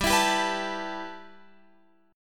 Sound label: G 6th Add 9th, Guitar, Open F; G6add9 strummed